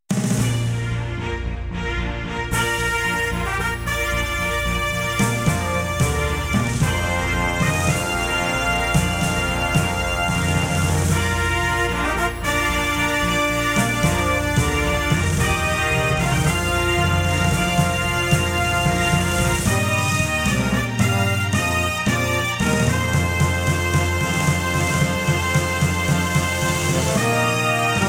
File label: golf fanfare